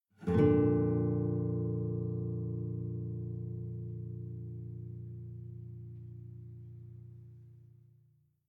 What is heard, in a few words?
Savannah Music